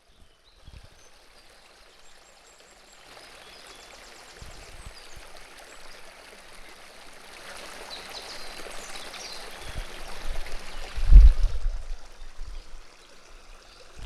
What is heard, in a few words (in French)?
bruit du ruisseau